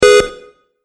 Car Horn Short; Car Sound Effects; Vehicle

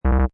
Slot Machine Musical Ditty; Casino, gambling, Slot Machine or other games machine, short electronic musical ditty; Lynne Music

